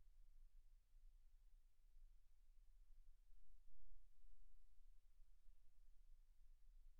silence 48kHz

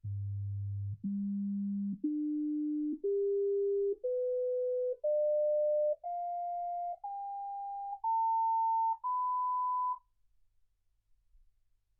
sinus tones 48kHz

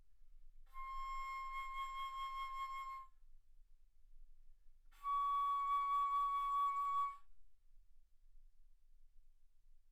Bass Flute C6 Db6; ff

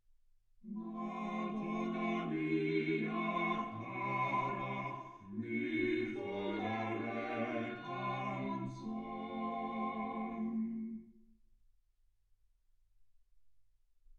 quartet

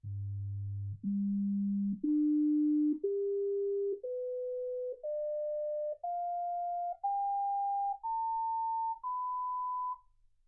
sinus tones 48kHz

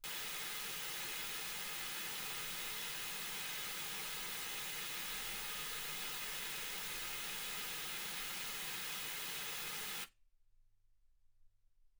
wgn

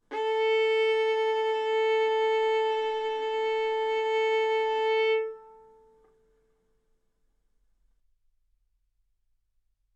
Violin arco A4 B4; mf; sul A; stereo

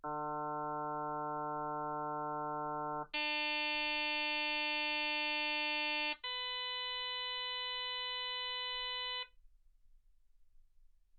harm sinus 48kHz

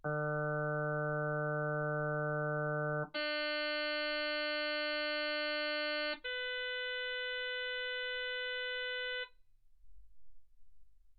harm sinus 48kHz

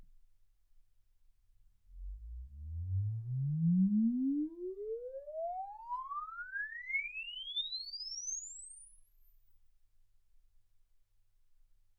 exp swept sinus 10Hz 24kHz

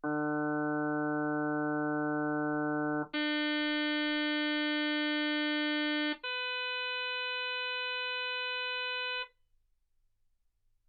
harm sinus 48kHz

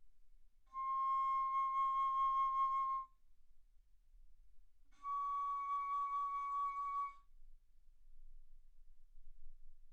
Bass Flute ff C6 Db6

